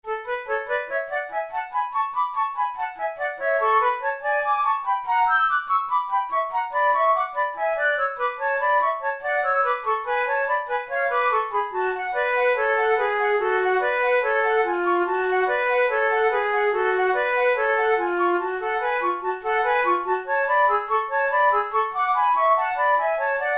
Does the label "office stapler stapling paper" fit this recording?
No